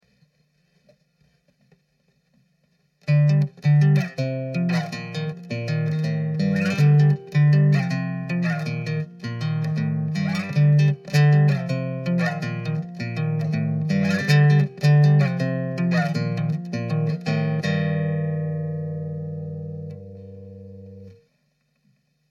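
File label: Gm 160 guitar riff trash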